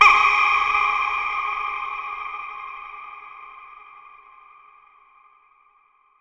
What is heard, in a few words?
Huh Hit; Zero-G Sound Sense Grime Scene; 90 s Sample CDs